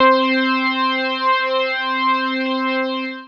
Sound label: PAD; PADS; PADS 2